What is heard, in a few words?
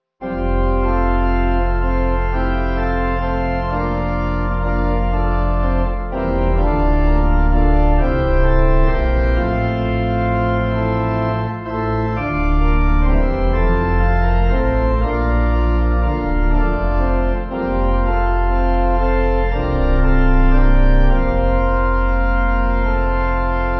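Pipe organ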